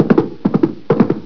RIDE FAST; MOVEMENT

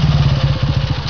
BIKE STOP; TRANSPOR

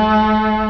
TONE

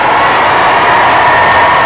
crowd

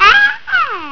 INFANT; PEOPLE

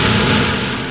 HISS; ANIMALS

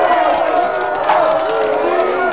CROWD BOO; PEOPLE

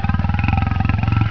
growler; ANIMALS

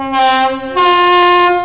AIRHORN

